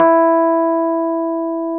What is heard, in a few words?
RHODES E3; Sonic Reality - Vintage Timetraveler